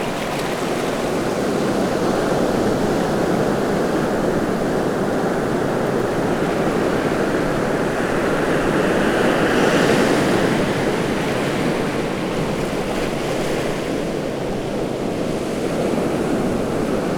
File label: Ocean Waves Surf On Rocks Close; Coastal; Coastal Ambience and Beaches